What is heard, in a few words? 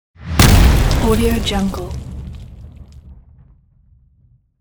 explosion